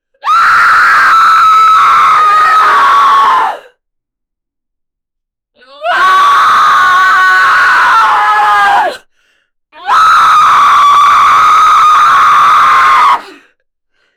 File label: screaming person; drama